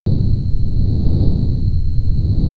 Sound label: Afterburner, synth fx; StarTrek; X-Static Goldmine